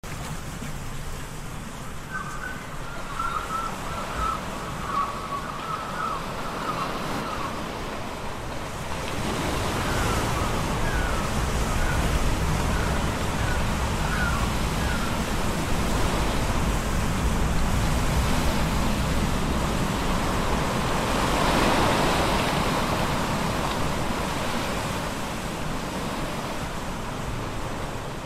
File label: Ocean Waves Meditation Water Sounds for Sleep White Noi; Ocean Waves Meditation for 5 min; White Noise for Foc